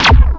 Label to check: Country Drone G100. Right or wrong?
Wrong